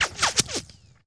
Bullet Whiz By Multiple; Sony Pictures Sound Effects Series; Ricochets - Hits - Whiz Bys

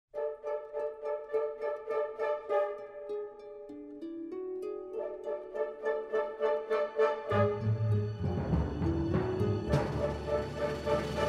Music

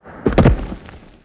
Body Fall On Dirt, Hand To Hand Combat; Sony Pictures Sound Effects Series

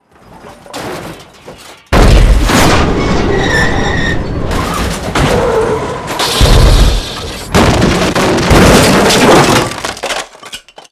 vehicle impact severe, crashes; Sony Pictures Sound Effects Series; Vehicles

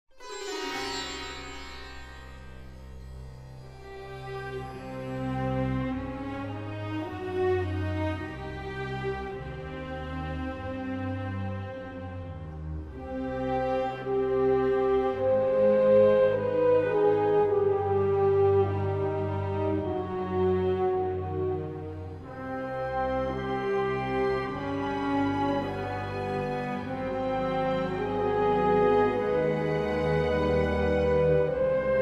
Music

